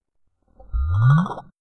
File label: Jellyfish